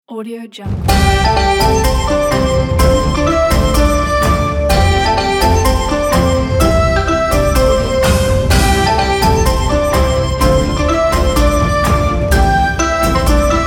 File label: chinese logo ident; wavebeatsmusic